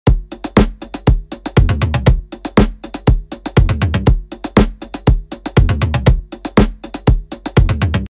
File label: HARD DRUM BEAT HARMONIZED TOMS